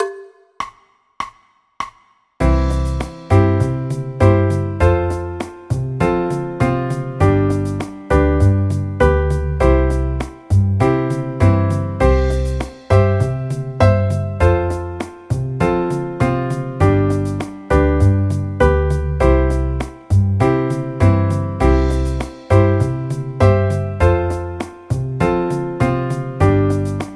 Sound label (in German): c dur akkordverbindungen